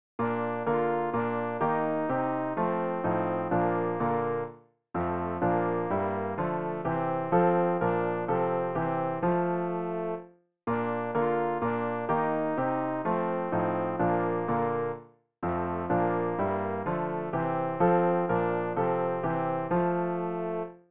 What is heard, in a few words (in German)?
alt, Übehilfen für das Erlernen von Liedern; ref gesangbuch; rg 243 dir dir jehova will ich singen